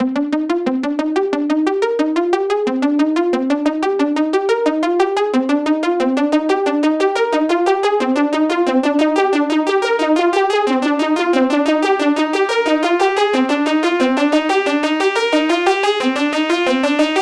Sweet Wide Plucks; Cherry Audio GX-80

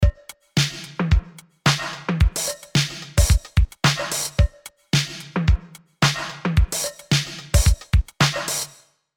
80s Drums Kit unbearbeitet; Arturia CMI V

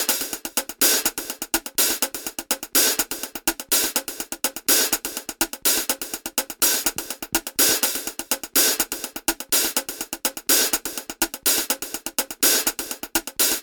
XLN Audio Life Strut Funk

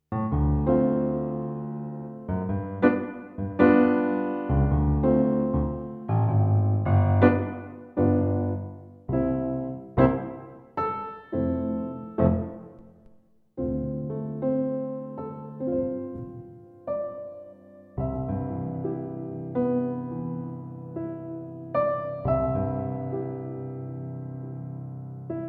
Vintage Grand Piano; Studiologic Numa X SE

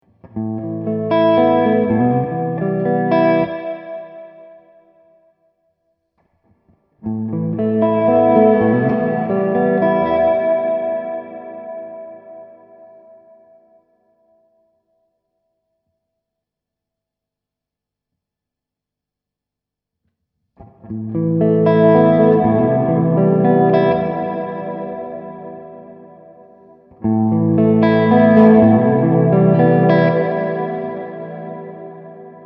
Plate

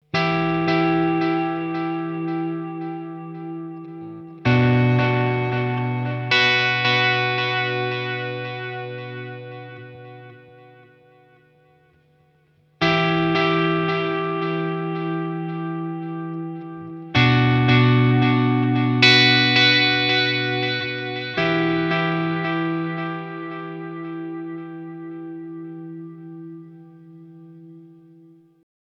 pushed Tape Echo Spring Reverb Bloom aus an; Boss Katana Artist

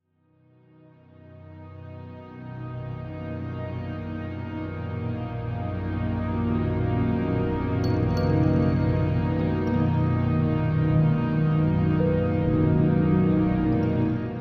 Beetlecrab Tempera Improvisation

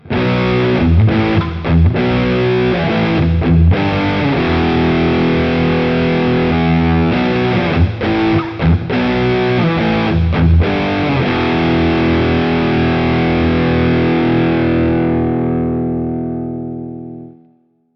Modern Brown; Boss Katana Go

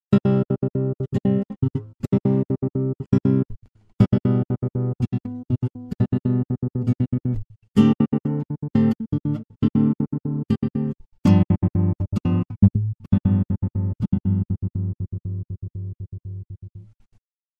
trance gate; EFX Motions

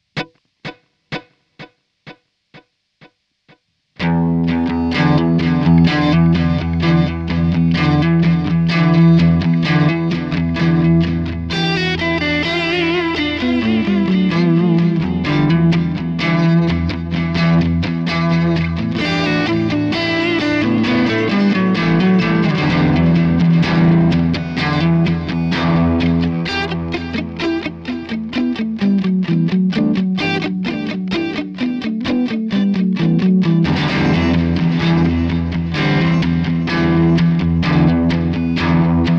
Ambient Echo; MXR Joshua

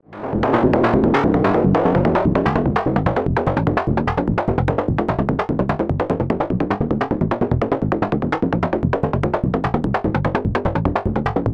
Behringer Syncussion SY-1 E stereo SH plus LFO

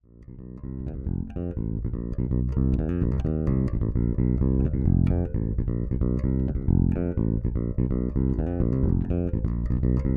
Radial J48 Monarch Lowcut Bass boost; Fodera

